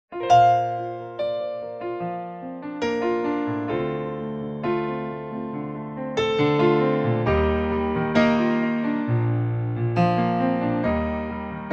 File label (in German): sängerin Pianoplayback Bb-Dur